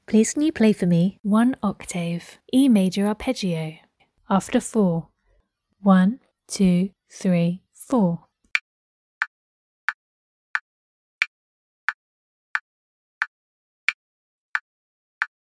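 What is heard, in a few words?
organ, E major arpeggio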